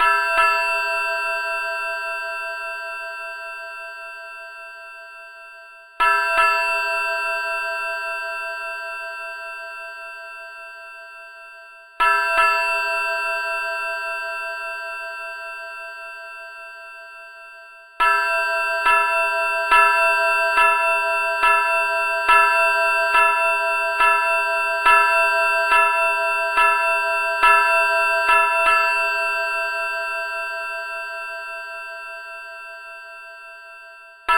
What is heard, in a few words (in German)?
Tschechisches Totengeläut